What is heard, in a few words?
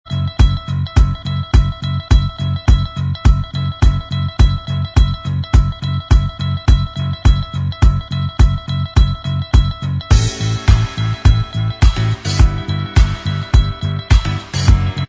Inst Track